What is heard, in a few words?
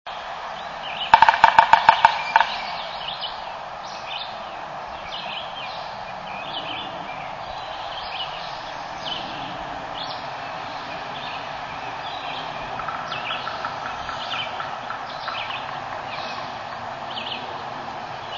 woodpecker drum